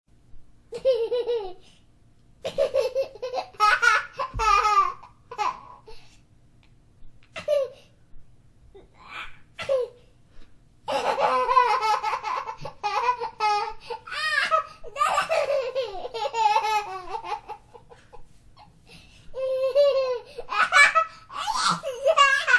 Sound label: Kid Laugh Long